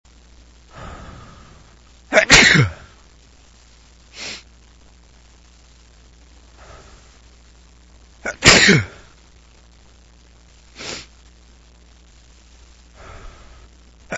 my sneeze, male

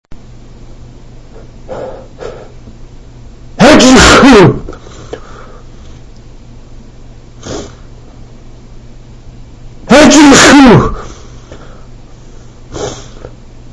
cold sneezes, male

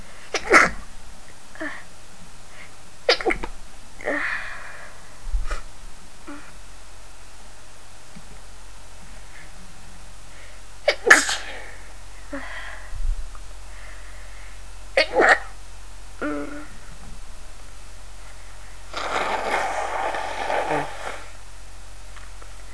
stifles, female

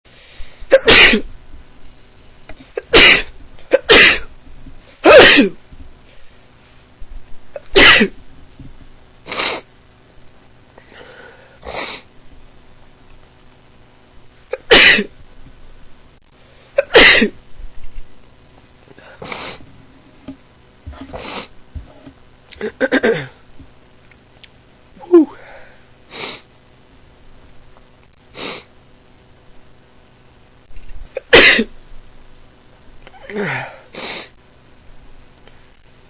sneezy day, male

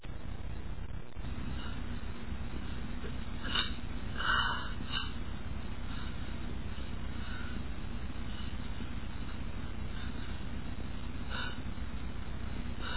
stifle, male, achoo

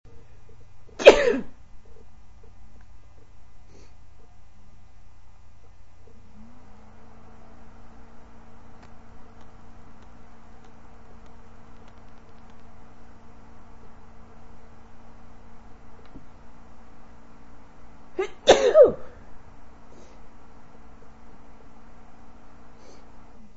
winter cold, female

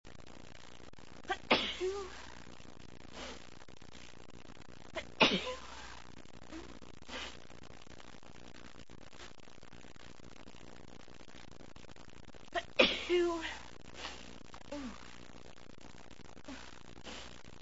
cant stop, female

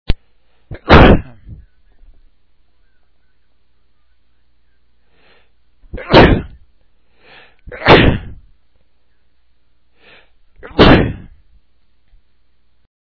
3 sneezes, male

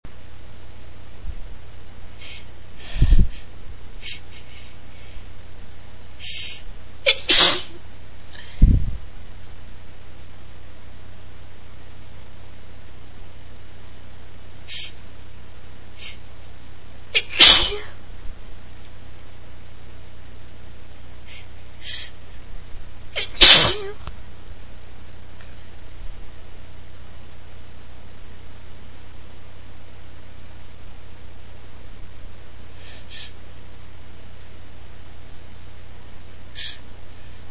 Light Dusting, female

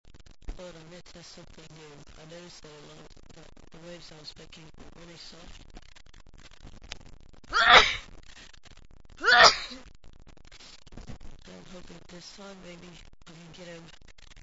aussie sneezes, male

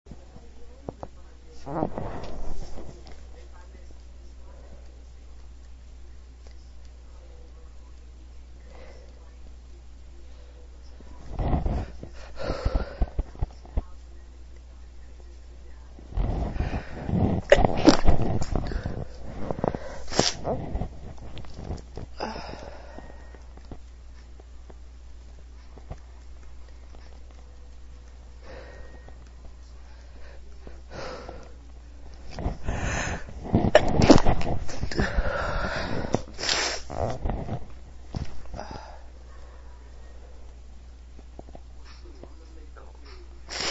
stifles with buildups, female

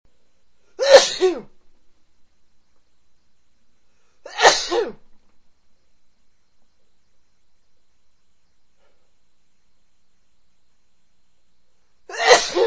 big ones, male, i like sneezes

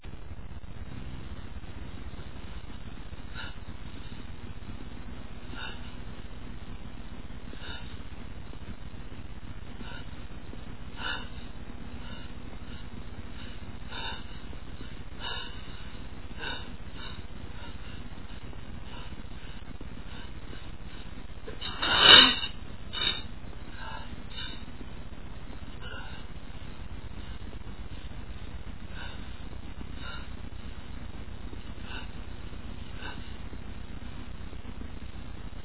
headcold, male, achoo

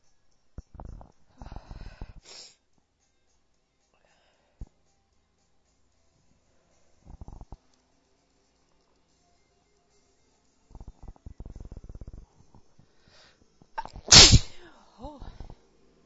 messy long build, female

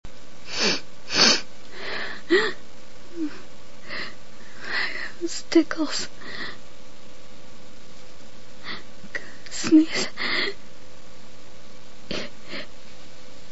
some stifle, female

